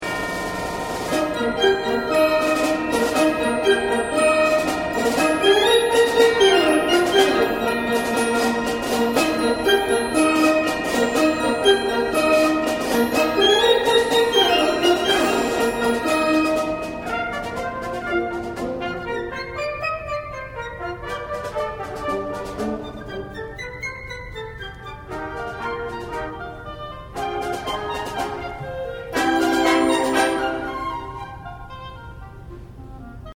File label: Viennese Musical Clock, Orchestra; Hary Janos II